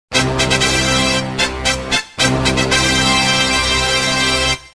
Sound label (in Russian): Смс фанфары